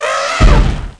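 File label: elephant attack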